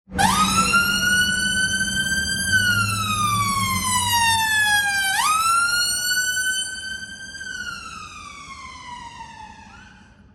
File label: london ambulance siren sound